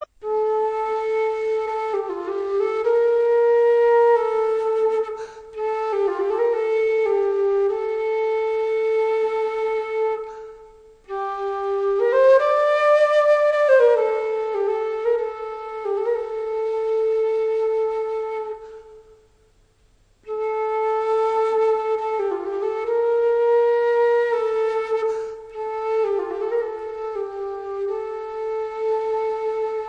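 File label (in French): traverso; instruments medievaux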